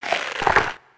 咬东西

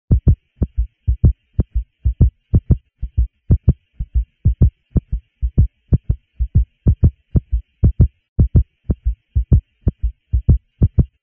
心跳加人声ONE TWO THEER秒

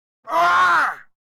喧叫

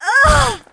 女 死亡 倒地

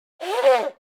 擤鼻涕

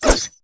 女声被击中; renlei

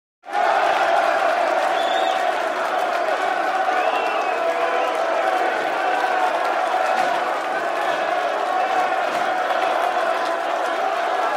足球运动比赛人声喝彩声观众口哨欢呼声此起

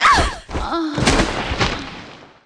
女死亡倒地

